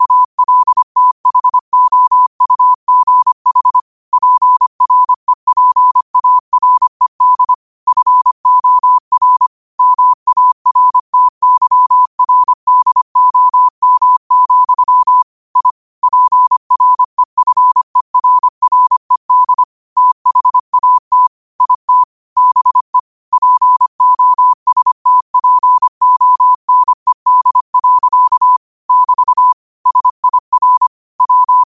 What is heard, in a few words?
5 wpm, morse